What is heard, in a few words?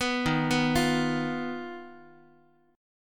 D sharp Suspended 2nd Sharp 5th D sharp Guitar; arpeggiated; JGuitar